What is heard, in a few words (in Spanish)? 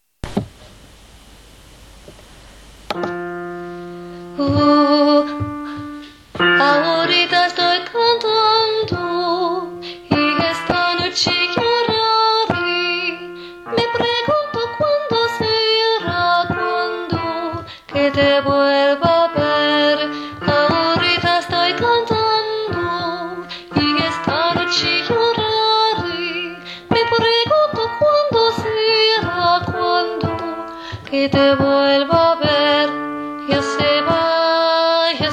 ya se va, alto